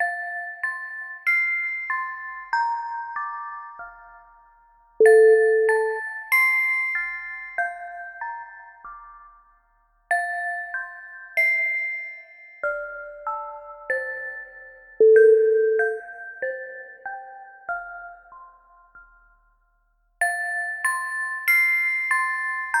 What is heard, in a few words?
christmas lullabye